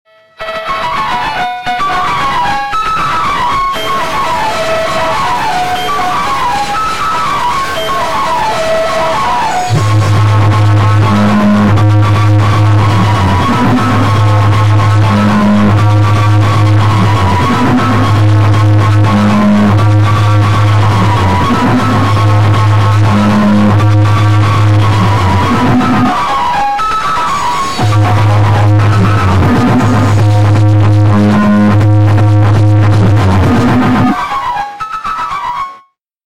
Aggressive Phonk Drift Phonk christmas Drift Remix